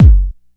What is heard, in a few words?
subtle reverb kick one shot F sharp key; kick drum